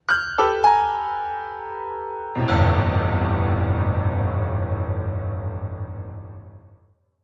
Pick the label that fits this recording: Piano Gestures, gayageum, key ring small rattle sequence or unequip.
Piano Gestures